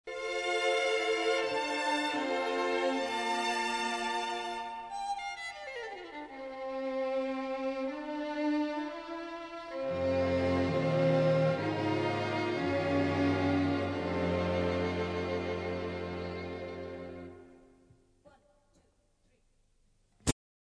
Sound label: String